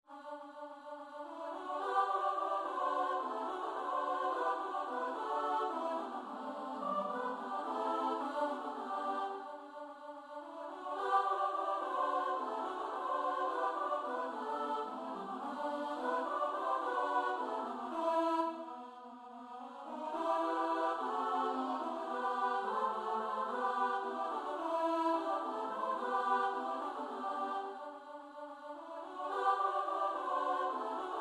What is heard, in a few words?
midi